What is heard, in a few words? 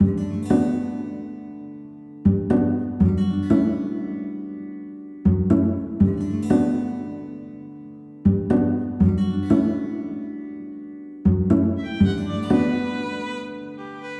Empty Museum Guitar